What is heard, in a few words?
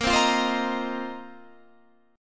A sharp Suspended 2nd Suspended 4th, Guitar Standard; strummed; A#sus2sus4; JGuitar